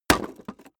wood hit hammer

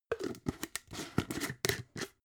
jar glass plastic close

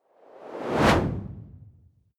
whoosh simple hard incoming high

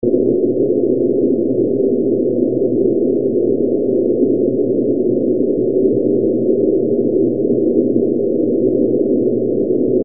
noise

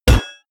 hit metal hammer large

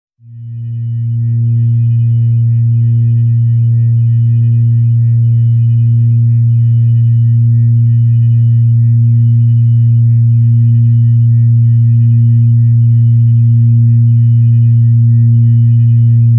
organ tone a sharp

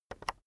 keyboard external enter release soft

